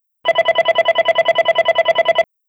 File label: wireless call; densetsu; signal